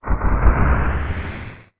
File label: Keaton Appear